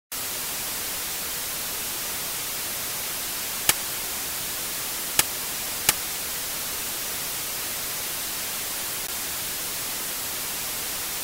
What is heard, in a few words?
noise